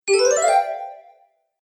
Magical Message Notification

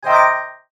chime harp, Chime Harp Swell; Production Element Imaging Element Accent Transition; Chimes; Multimedia; Blastwave

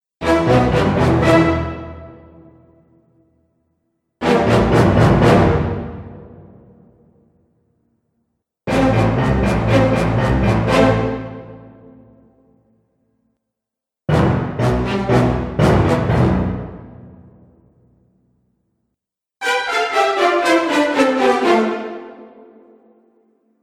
Action Film Dramatic Event Collection, musical

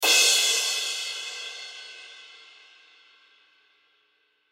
Drum Hit Cymbal, Drum Hit Cymbal Crash; Musical; Cymbals; Blastwave; Sound Effects:Musical

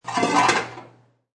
Scraping pots.; Pots and Pans; Restaurants

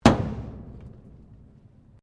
METAL IMPACT THUD; Materials

